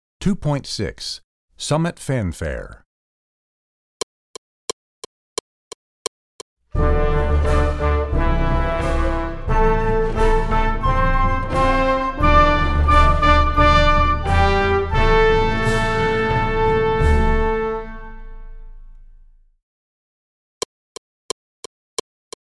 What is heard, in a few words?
Summit Fanfare